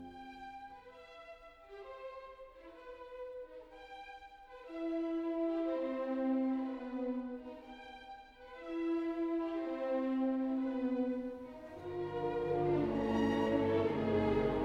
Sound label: 4th Movement Gesangsperiode Beginning; Bruckner 9th; Rattle